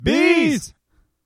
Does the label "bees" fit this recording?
Yes